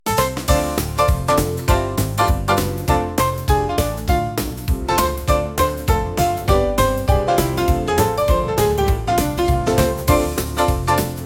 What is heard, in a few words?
Echoes Of Retro Grooves